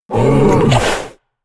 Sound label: Black Bear Vocal; Animals; XBOX; BassProShopsTrophyHunter2007